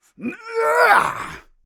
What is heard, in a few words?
Warrior Hi-Tek Trooper Grunt Physical; Realm Royale